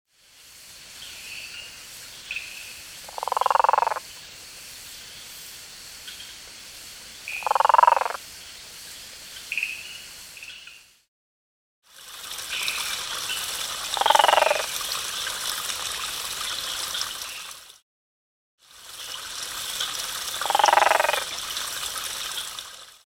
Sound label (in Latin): Mantidactylus guttulatus